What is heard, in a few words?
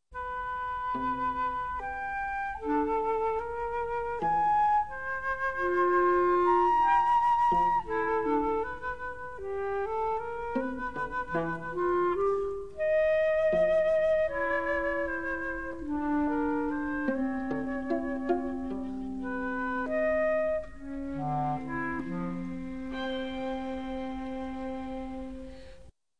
Flute; Stravinsky